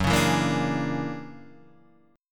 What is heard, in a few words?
Fsharp 7th Flat 13th, guitar chord; F#7b13 Chord {2 4 2 3 3 4} strummed